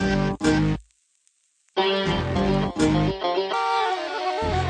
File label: 102 dirty organ mideast thing; SAMPLED MUSIC LOOPS